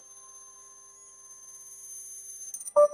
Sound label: correct ding; sound effects